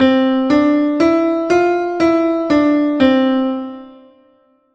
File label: Piano